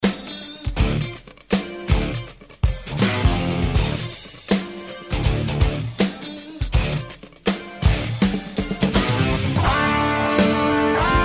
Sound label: solo